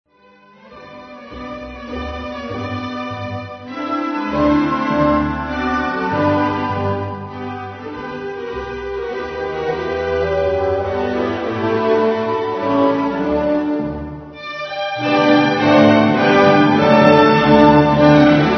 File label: menuet g dur